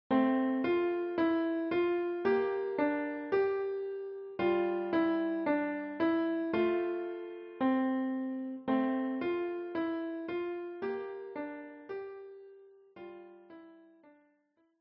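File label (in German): Aura Lee Klavier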